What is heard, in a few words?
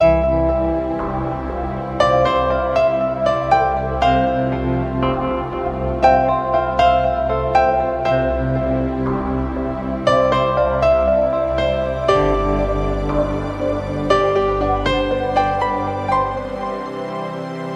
lovely piano ringtone